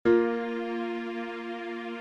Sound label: Bb Maj Bb F